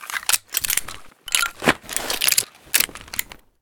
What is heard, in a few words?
taurus reload two